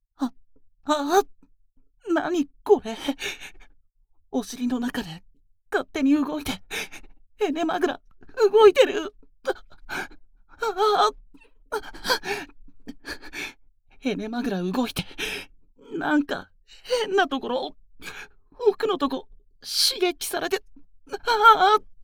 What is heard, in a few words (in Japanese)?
耳舐め おねだり, ASMR; エネマグラ挿入のまま耳舐めおねだりヤってみよう！